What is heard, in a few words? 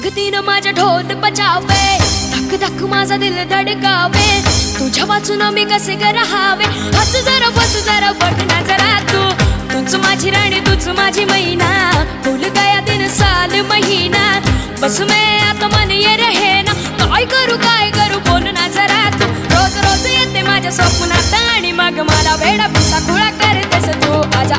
Remake Marathi